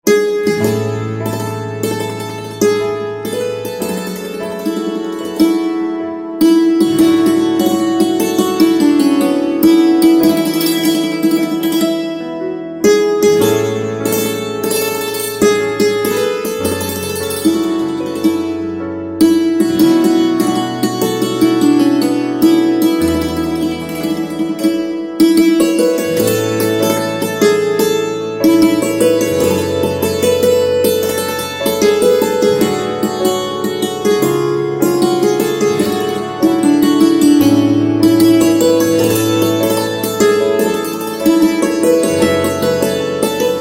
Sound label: Santoor